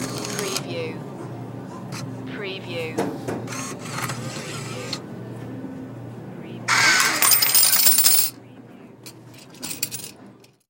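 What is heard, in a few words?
Change Machine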